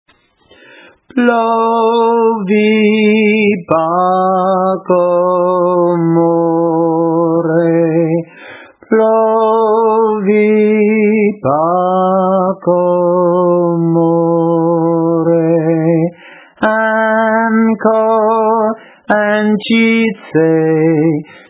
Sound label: Tenors